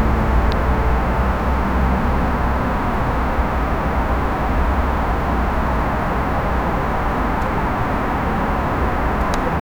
call music scenes fade in

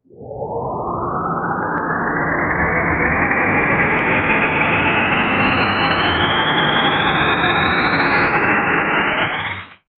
neural network boosting

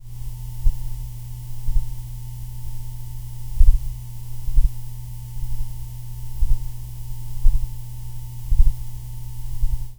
realistic mobile phone vi; device

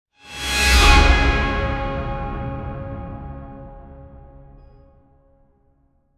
ambience stadium crowd, metallic hit

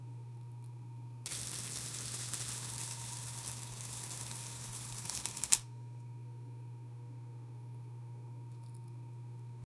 bread toaster sound

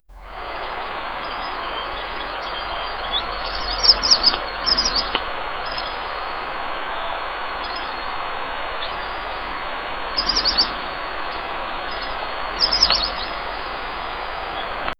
yawning sound at morning

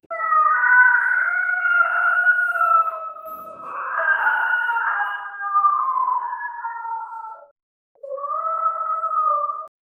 a nightmarish creature a; alien

